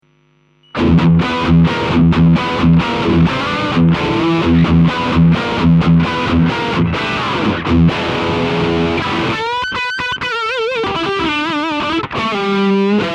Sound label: distortion